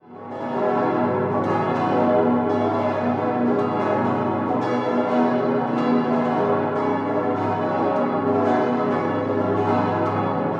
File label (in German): Stiftskirche in Baden Baden plenum